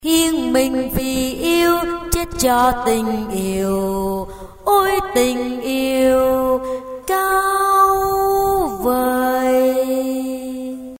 basso

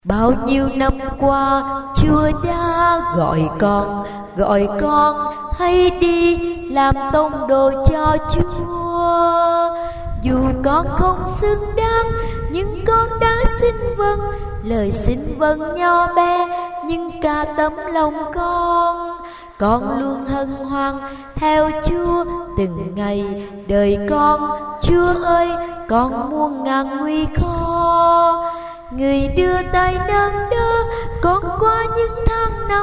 tenor basso

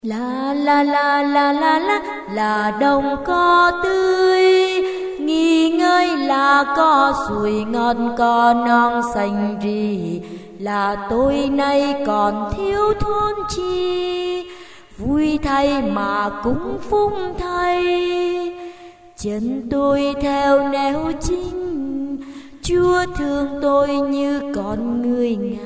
tenor basso

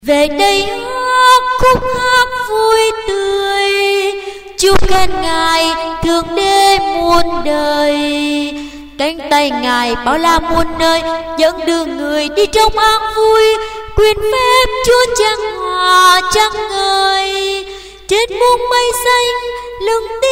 sop alto

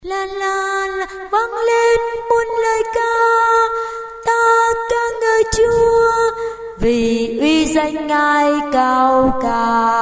tenor

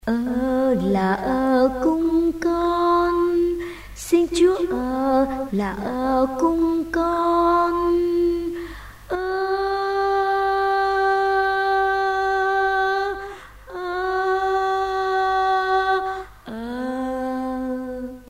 tenor basso

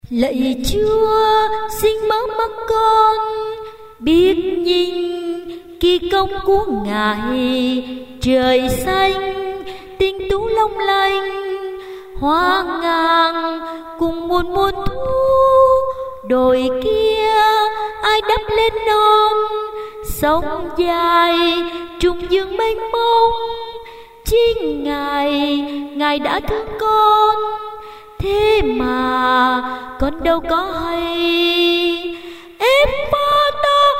tenor

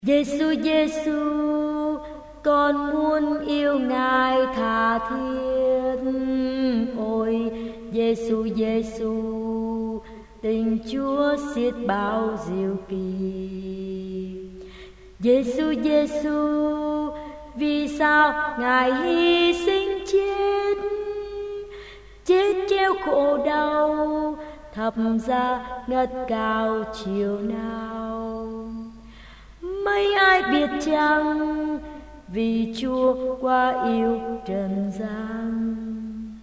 basso

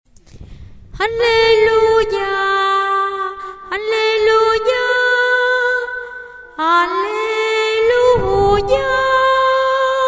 alto

